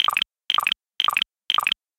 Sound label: gambling mouse hover; Back to 70s; wazdan